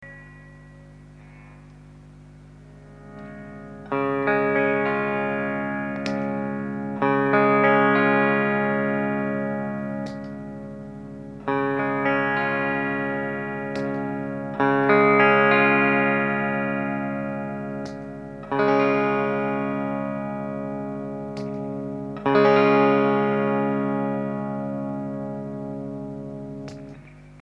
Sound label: GROOVE COMP, CLEAN COMPRESSION; K&R; LEVEL4 SUSTAIN7; ATTACK7